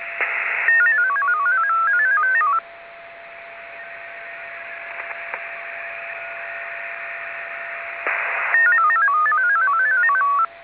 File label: Alcatel 801H 8-tone MFSK system running 16.67 Bd / 50 bps; IRS mode; ALCATEL 801H MODEM 8-TONE